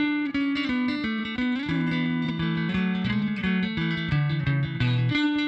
Slinky, Guitars; Rock

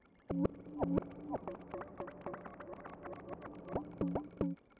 Warp Wave Drum; Ambient Cinematic; Rhythmic Atmospheres

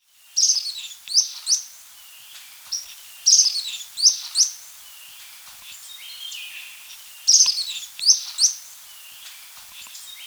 Marble-Faced Bristle-Tyrant (Phyllos; S. America

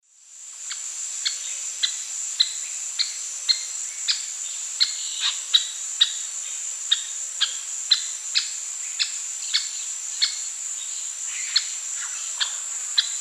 Laterallus fasciatus, calls; S. America; Eastern Lowlands

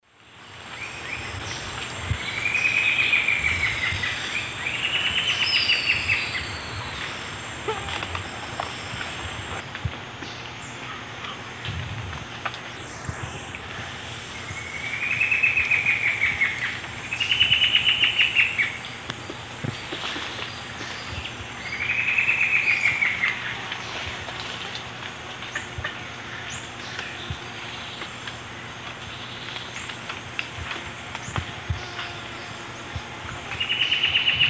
Herpsilochmus gentryi, duet; S. America; Eastern Lowlands

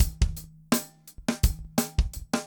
funk 170; odd loops

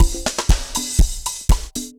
dancefloor perc 120 bpm

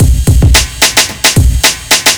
block rock kit

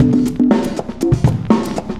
cooling 120; loops

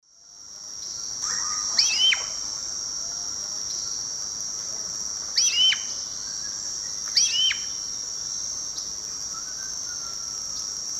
Turdus ignobilis, call; S. America; Eastern Lowlands

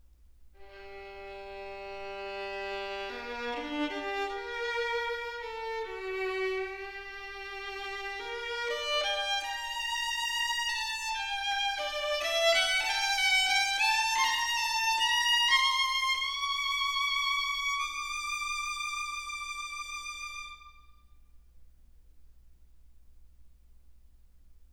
Violin Recorded With Clean Power

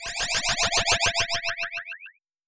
Laser Zap; Dance; SFX One Shots

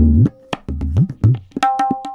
tabla funk 110

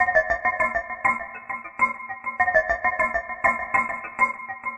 Gel Riff, Sequences; Electronica

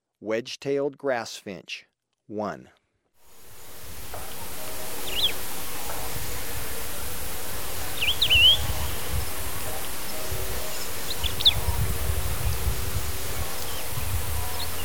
Wedge-tailed Grass-Finch, Birds of Ecuador; S. America

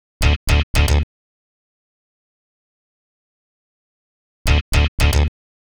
Bass, RnB

